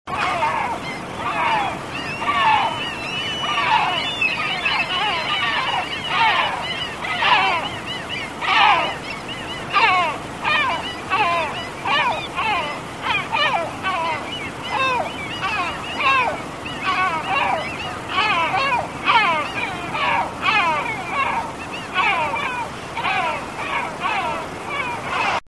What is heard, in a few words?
Larus marinus